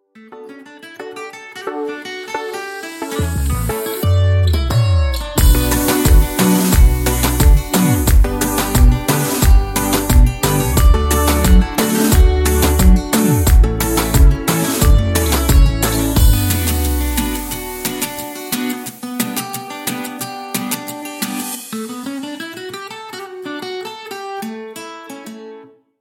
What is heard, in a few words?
Instrumental Ringtone